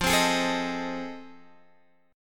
F 7th Sharp 9th, Guitar, Open G

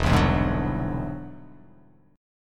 E Augmented 9th E down; E+9 strummed; JGuitar

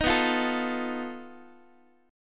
B 7th Flat 5th B Guitar; B7b5; strummed

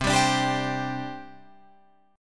C Suspended 2nd, C, down, Guitar, Open F; Csus2 strummed

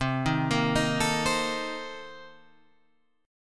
C Minor 13th C Guitar Open G

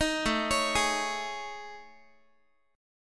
A Major 7th Flat 5th, down Guitar; AM7b5; arpeggiated; 18,17,16,18,x,x

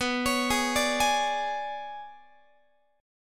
B Minor 6th Add 9th B, Guitar; Bm6add9 arpeggiated

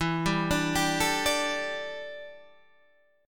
D Suspended 2nd Suspended 4th E

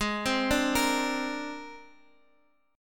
C 7th Suspended 2nd Sharp 5th, Ab

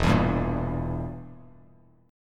E Diminished E, Bass Standard; Edim strummed; JGuitar

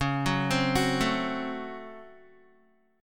Db Major 7th Suspended 4th Sharp 5th Db Guitar; DbM7sus4#5 arpeggiated; JGuitar

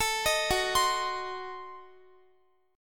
Gb Diminished 7th, down, Guitar; Gbdim7 arpeggiated; 4E,4A,5D,5G,5C,6F

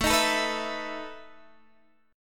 E Major 7th Suspended 4th A

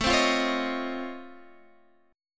Db Augmented Major 9th, A

